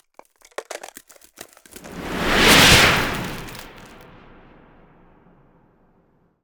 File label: Ice Spell Whoosh, Cold Whooshes; Arctic Aura